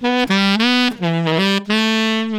sax; cubase5; variaudio

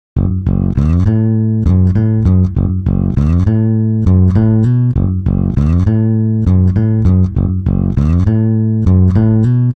bass, pitchshift; apple logic pro monophonic

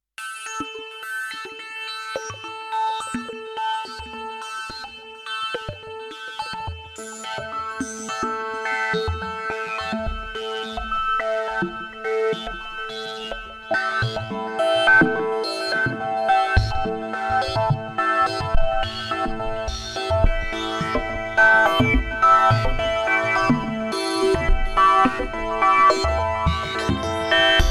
lightwave pad fx; use audio plugiator

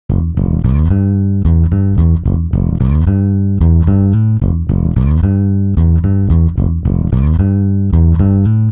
bass, timestretch; digidesign pro tools xform

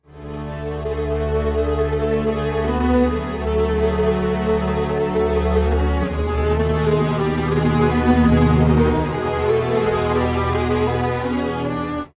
strings; timestretch; prosoniq time factory clear scale

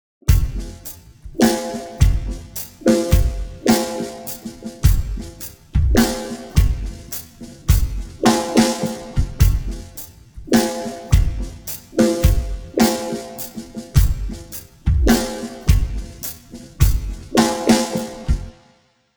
drums; prosoniq time factory mpex3; timestretch

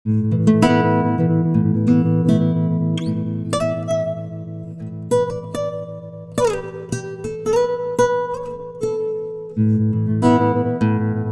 guitar, timestretch; i3 dsp quattro x

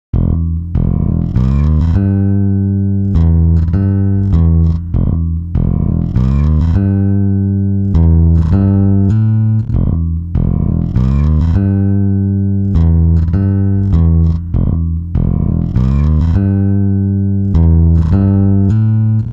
bass; digidesign pro tools ts; timestretch

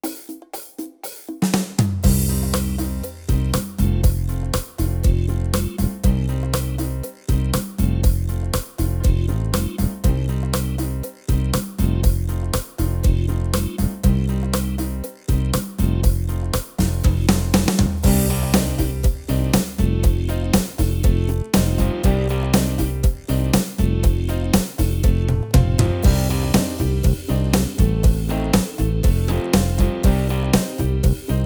tyros3 style latin rock chacha, yamaha

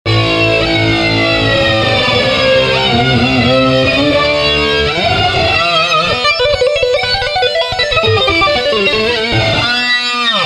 guitar flanger; FX Story le flanger

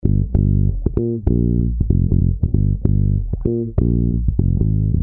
bass orig; celemony; Melodyne Plug-In

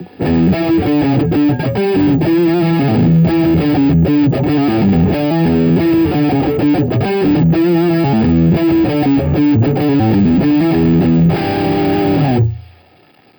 lead neck gibson; custom77 cs4

